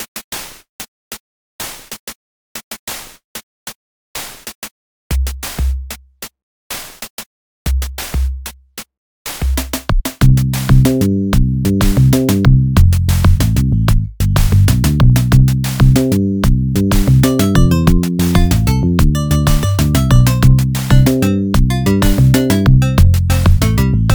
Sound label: music pause; Duck Game